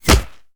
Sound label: Monster Generic Foley Hit By Bullet